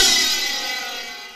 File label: 909 ride